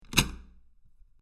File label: Locked Metal Door Handle; Door Closing; Doors; Crafted Signals